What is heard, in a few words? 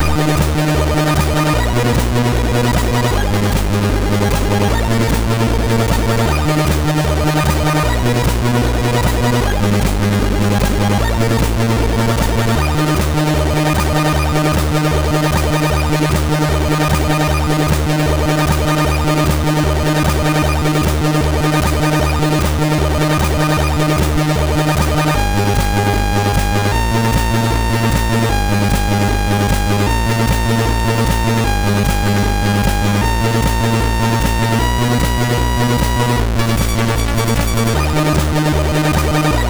Spook tune MOS6581R3; hvsc